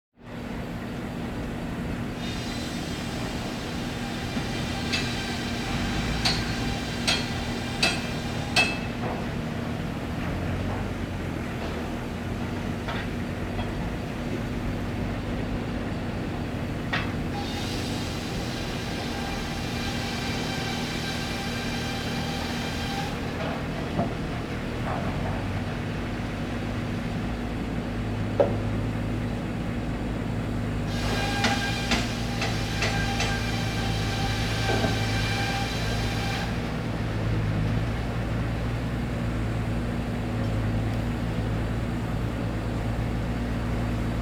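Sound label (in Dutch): Molen In Bedrijf; KMP Film Video Sound Effects; vrije tijd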